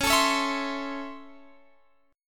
C sharp Minor Major 7th Sharp 5th C sharp Guitar Open F; C#mM7#5 strummed; JGuitar